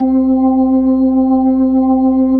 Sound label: B-3 C 5, Jazz; Keyboards of The 60's and 70's